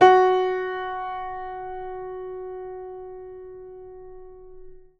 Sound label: piano